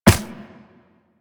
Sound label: Run Ice, Footsteps; Warframe; Operator